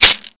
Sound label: Perc Sm Shekere; pitchglitch